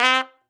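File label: C Low Trumpet Hit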